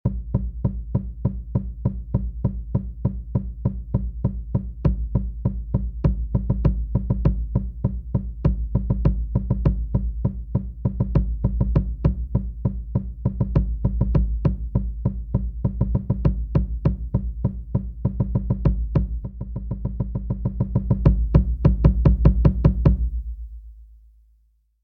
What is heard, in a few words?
bass Drums